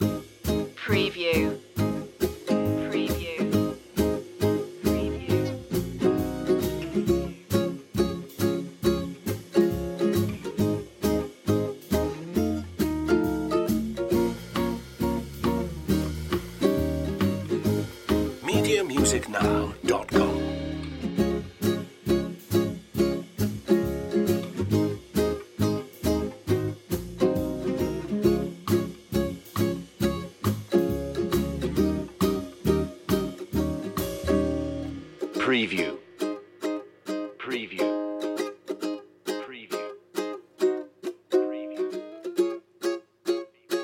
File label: tiki beach loop